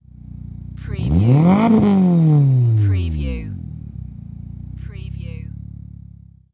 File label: MITSUBISHI ECLIPSE EXHAUST SINGLE REV